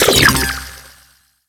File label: Trap Death Burst, Weapons; Warframe; Cephalon; Librarian